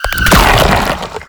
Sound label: Horrors Charge; Warframe; ManInTheWall; Octopede